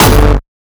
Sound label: kick oneshot monster F; GhostHack